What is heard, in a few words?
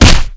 punch hit player; weapons